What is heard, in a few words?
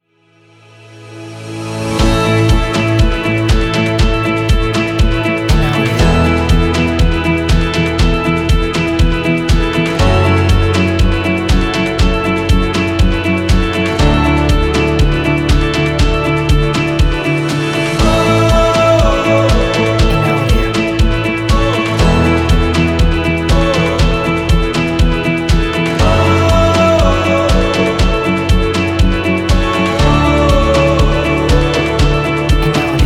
Action Uplifting Pop Main Version